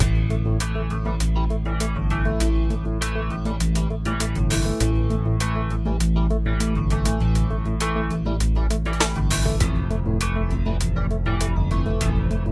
Circuit Short Loop; gear club; MusicGen SonicAventure

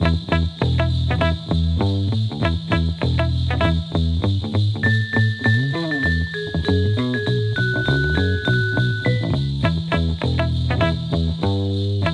Xmas Jingle Miracle Low; bully scholarship edition

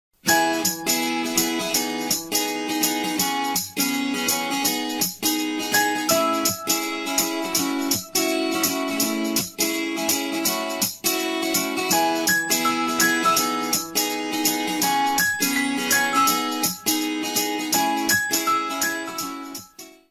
Loading Screen; Yoshi's Woolly World

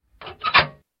Lock Open

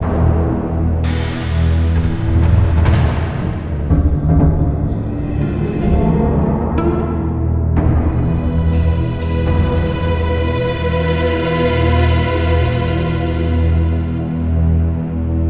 Caves Melody Loop

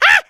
Monkey boss Hit; angry birds rio

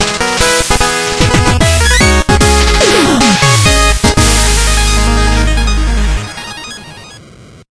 Music Boss Victory; Shovel Knight King of Cards

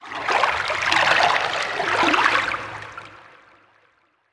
environment water calm distant

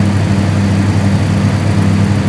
Syclone Low

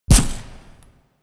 M4 Single Shot (Suppressed); Redmatch 2

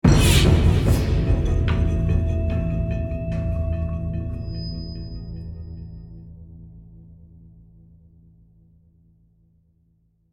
Demons Stinger

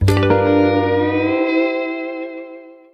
End Stinger; The SpongeBob Movie Game: Sponge on the Run; Bikini Bottom

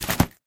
Propellerhat Rainy Collect; doodle jump 2; android

